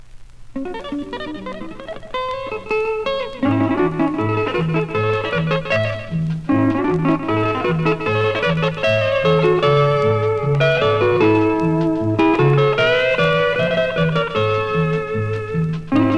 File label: polka